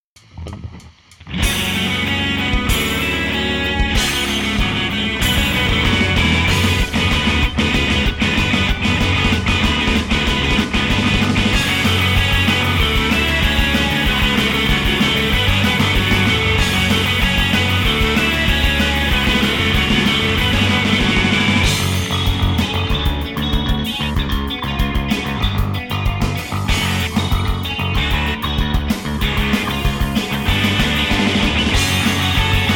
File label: karaoke; megane; sdvx